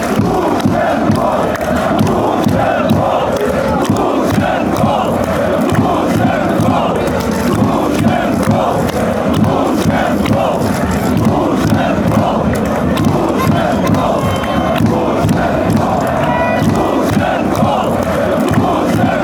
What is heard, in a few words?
drumming